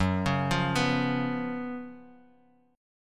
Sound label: F sharp Major 7th Suspended 4th, Guitar Drop D; chordshape; down